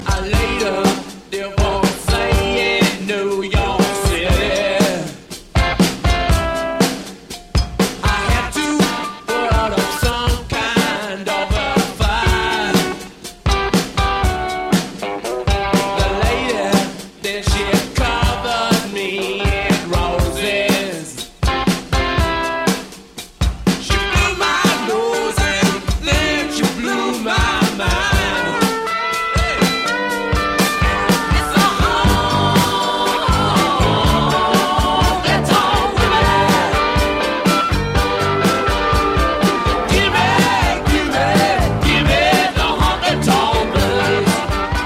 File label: honky tonk